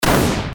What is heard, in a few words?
shot; shoot the zombie